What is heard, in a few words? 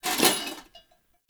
Knight Melee Swing Foley; Enemy; Mendicant; Mnd Knight